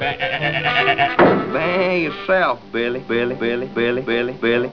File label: baaa; Droopy